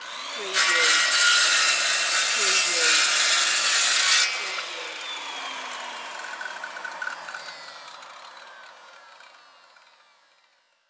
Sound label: Circular Saw Cut Wood